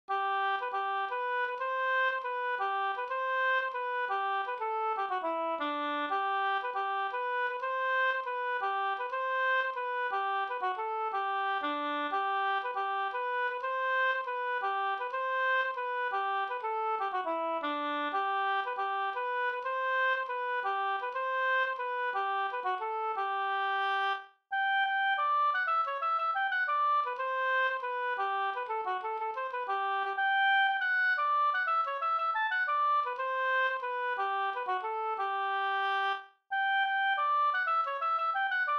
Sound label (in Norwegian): pols